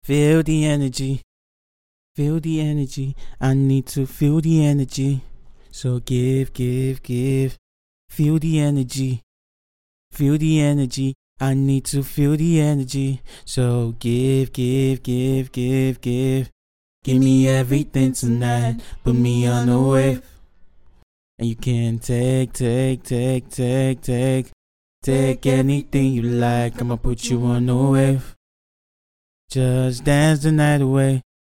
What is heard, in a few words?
pop rnb 120 EbM